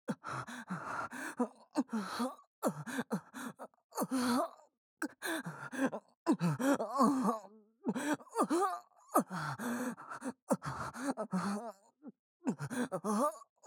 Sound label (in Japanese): 右 オホ喘ぎ; ヒルダ